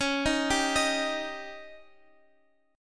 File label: C sharp Suspended 2nd Flat 5th